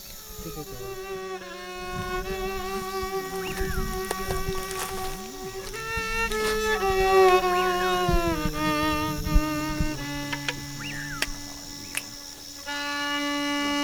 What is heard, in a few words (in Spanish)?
Instrumental De Violin; mexico 2005